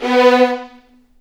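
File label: B3 violins; The Ultimate String Collection; East West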